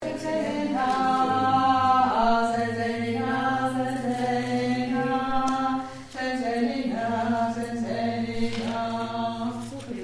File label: Alto